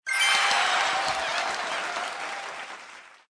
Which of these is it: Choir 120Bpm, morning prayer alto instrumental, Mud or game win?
game win